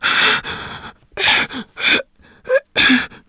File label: moan; Shadow Man; shadman